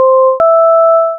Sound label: correct; ui; Correct (Chime)